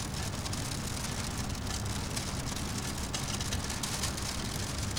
WIND BLOW; AKAI; 1000 Sound Effects; BACK GROUND ETC

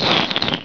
SLURP; sound effect; Nare Gewoontes

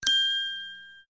SHOW CLOCK; Rosegarden

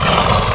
HORSE

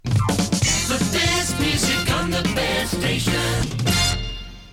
topformat maxi jingle single

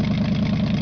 ENGINE

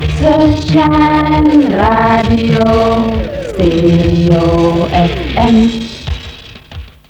sun Shine Jingle; SunshineJingles

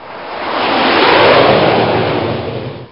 INTRO, Flyby

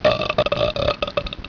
BIG BURP; sound effect; Nare Gewoontes

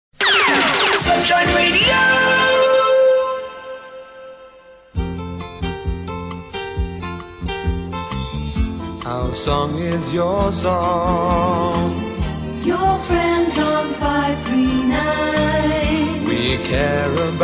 Radio Jingles; sunShine Alphasound; Hgv radio

